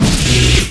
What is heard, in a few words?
turbo, ships; Quantum Redshift; XBOX; kukai